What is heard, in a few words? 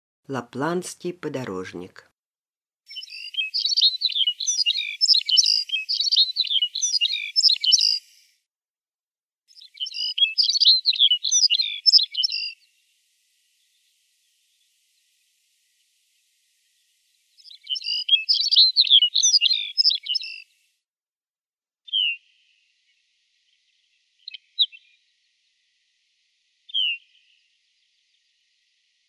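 podorozhnik golos, Lapland longspur voice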